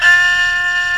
HEAGUM F 3, KOREAN

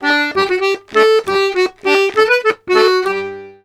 Accordions, BOUREE; USB Soundscan vol.40; AKAI

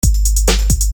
Beat, Hip-Hop Street Beats; Construction Kit; Sonic Foundry (Sony Creative Software)

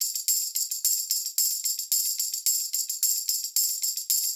Tamb Proc 110, funk soul Beats; sampled funk soul

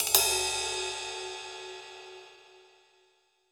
Pop Ride Phrase, Cymbals; Roland BK-5

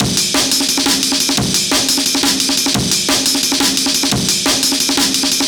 Amen EQ 175, Jungle Breaks

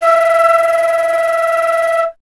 FLT FLTE, Flute; Miroslav Vitous - Solo Instruments VOL-2; Solo lnst. II

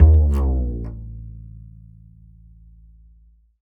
Upright Acoustic Bass

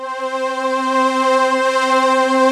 VP330 tutti; Rubber Chicken Software Sample Bank

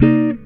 GTR CHORD; Univers Sons; Jazzistic

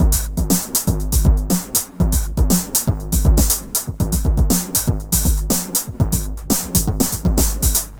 scary electro 120bpm, Downbeat Dance Grooves

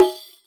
Rek Dom; Roland BK-5; Percussion

